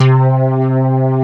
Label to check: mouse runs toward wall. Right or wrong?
Wrong